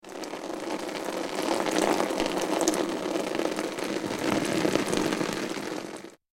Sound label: Rain On Helmet; raindrops, splatty